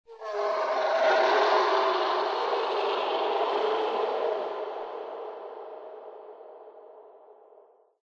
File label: animal call yeti, lair; Source Engine; tf